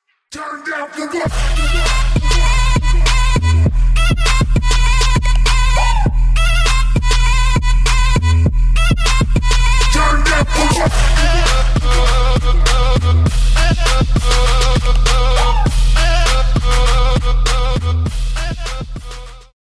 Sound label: trap